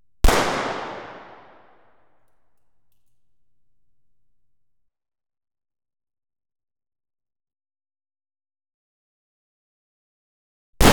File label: Explosions, Gunfire & Cannons, Pistols & Rifles; Telarc Sampler